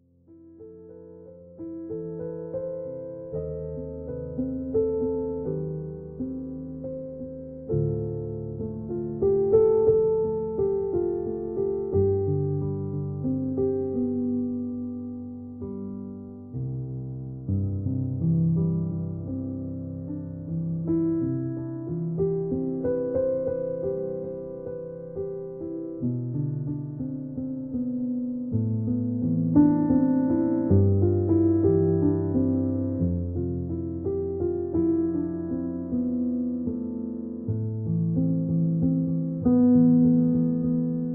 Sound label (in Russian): Muzyka Dlia Sobak, Uspokaivaiushchaia Muzyka; Muzyka Dlia Liubimoi Sobaki; Uspokaivaiushchaia Muzyka Belyi Shum